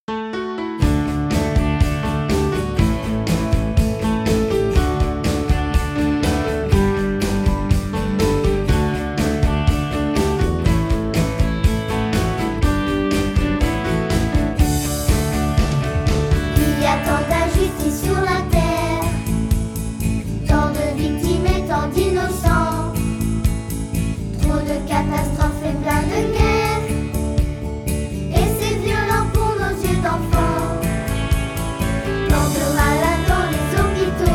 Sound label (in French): graine d amitie chant